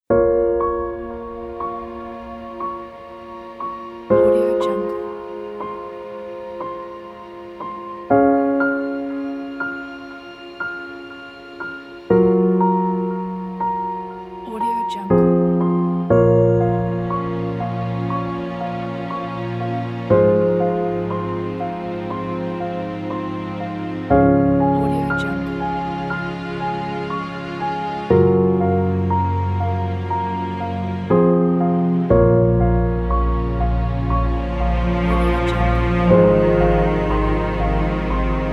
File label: sad music; melodrama